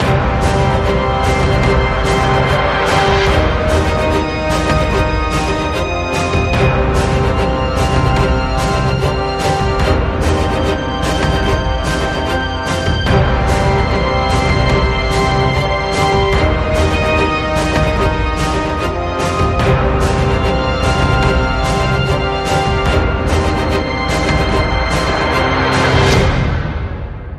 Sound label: Rolling march dark cinematic